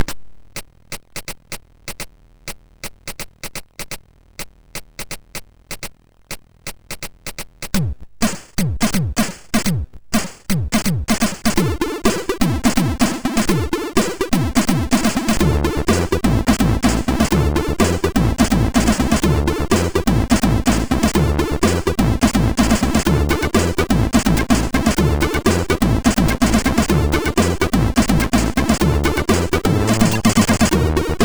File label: House Mix, MOS6581R2